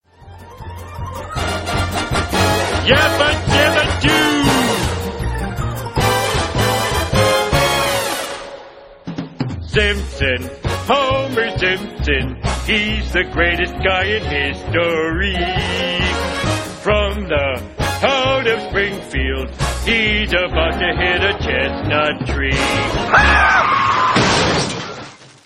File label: 80s music